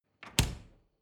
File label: refrigerator door close hard